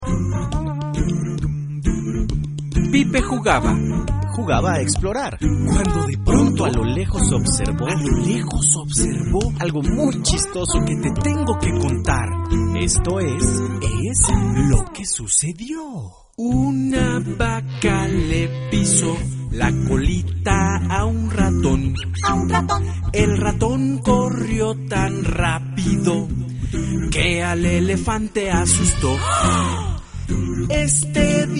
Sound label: pipe